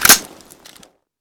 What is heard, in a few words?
charge, f2000